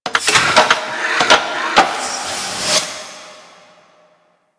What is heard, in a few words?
Seismic Disruptor Buildup A; Warframe; Ambience; Grineer Asteroid